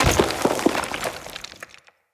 Thorn Bow Stone Arrow Impact Enemy; Weapon Impacts; Envoy Fey Bow

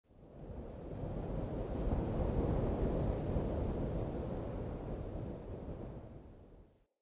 Fort Wind Gust Low Mid Howl; Ambience

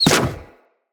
Fire Arrow Impact; Level Events; Midrath; Warsong Prologue

